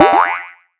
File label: Sheep Jump; Skylanders Cloud Patrol; Arcade, iOS, Mobile